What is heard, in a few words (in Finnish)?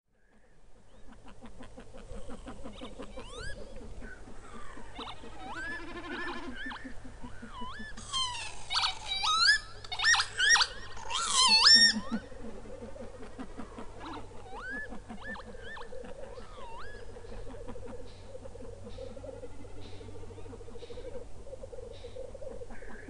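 töyhtöhyyppä, lintuäänet; Soitimella naukuvasti sointuva ”vii-uu-i viu viu”, siivistä kuuluu pumppaava humina; Melko yleinen pelloilla ja niityillä maan eteläpuoliskolla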